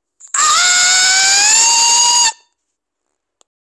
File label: haaaaaa